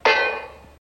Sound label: Boink Metal Quality